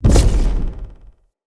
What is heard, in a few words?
Staff Caster Cast Long Shot, Weapons; Player; Staves; Galene